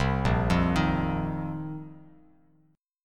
A sharp Suspended 2nd Suspended 4th A sharp, Bass Standard; arpeggiated; A#sus2sus4; JGuitar